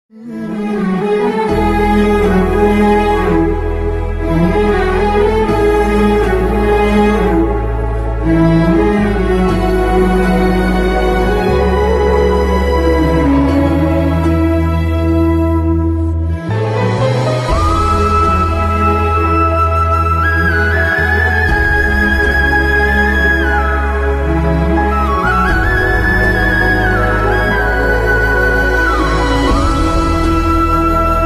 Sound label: sad love bgm ringtone feel music ringtones; mungaru male 2 movie